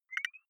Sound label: beep click high, signale